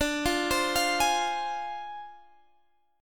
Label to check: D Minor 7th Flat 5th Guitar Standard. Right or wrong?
Right